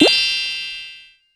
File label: Cookie drop Correct